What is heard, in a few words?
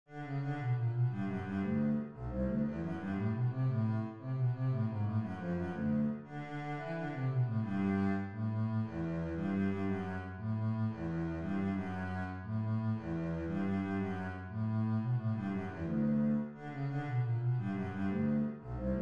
jarabe bass, string bass